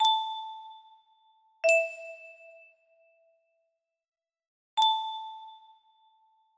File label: music box, music box melody